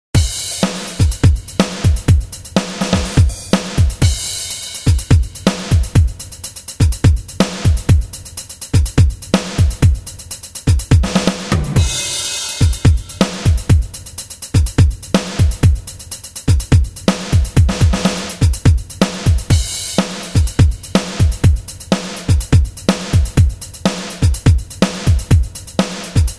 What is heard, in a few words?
Edgy Funk 124, Drum Grooves